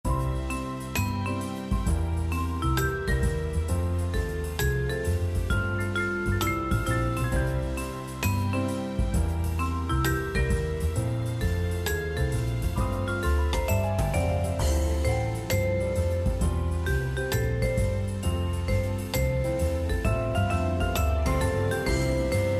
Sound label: bgm Music; bird-go-home